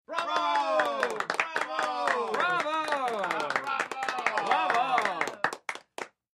Group Bravos Clap

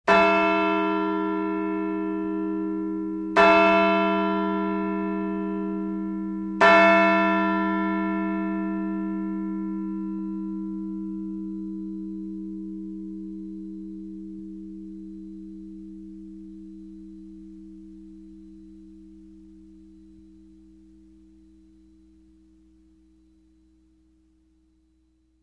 New England Church, bell towers

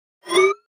Pop Up HF Elect Whip, pop up element

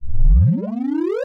synth efx rise

